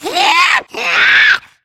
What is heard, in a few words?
spitter alert; cstrike; l4d2